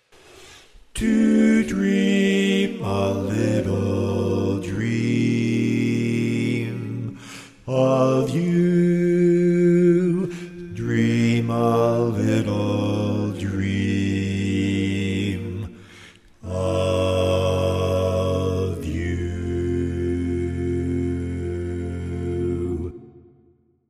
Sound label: Bass, barbershop